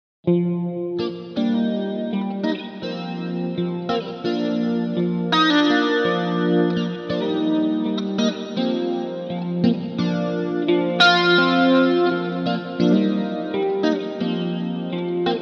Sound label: 4x10 ambient